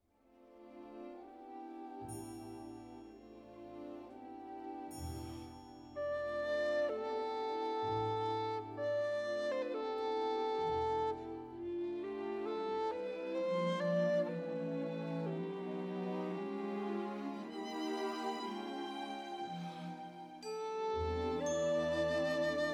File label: Sarabande; Sarabande Tarantella; I. Sarabande